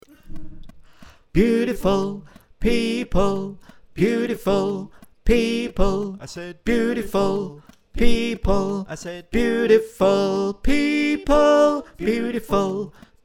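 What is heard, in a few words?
Tenor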